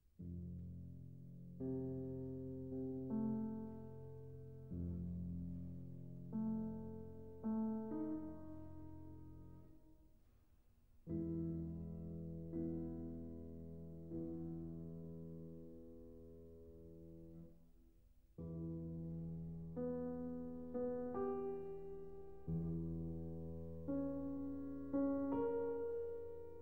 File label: Piano Sonata No. 21 in C Major, Op. 53 II. Introduzione. Adagio